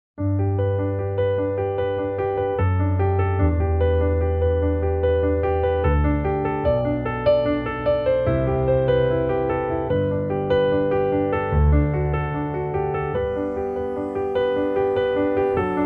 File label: Music